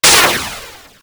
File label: fly; mob; army